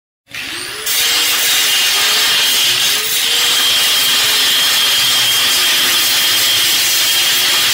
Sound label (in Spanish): Mini Esmeril Efecto de Sonido